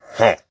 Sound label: pillager grunt; mobs; mobs mc